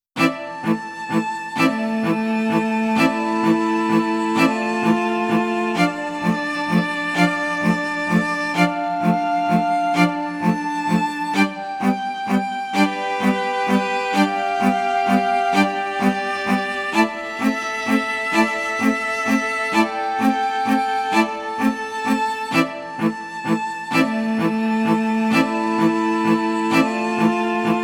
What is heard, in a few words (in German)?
streicher